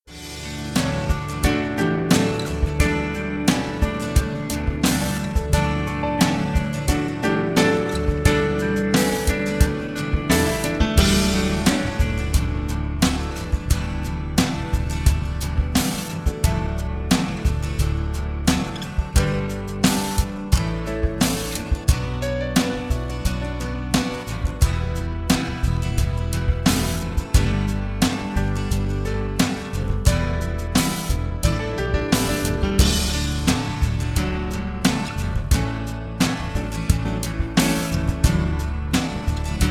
chanson sur instrument sans mélodie en Ré

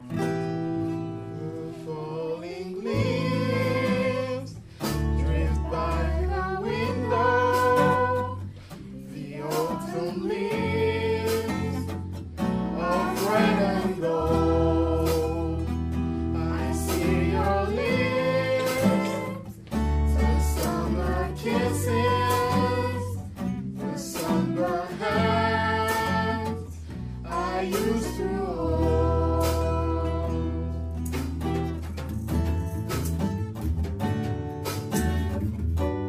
acoustique; enregistrements du 29 novembre 2017 en acoustique

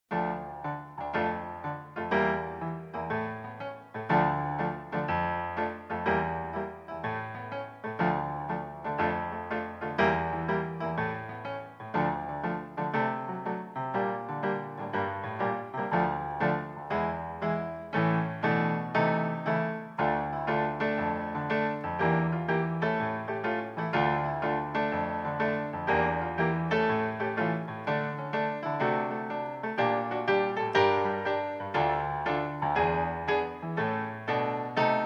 instr

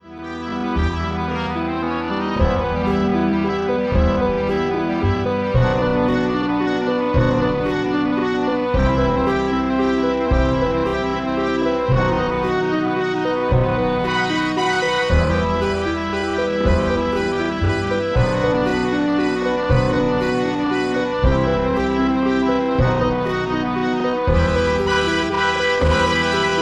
karaoké plus lent

